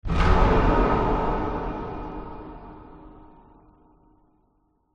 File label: cinematic hit horror fear long reverb; sound design